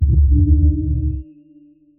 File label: sonar Ping Air Medium Directional; Barotrauma; Real Sonar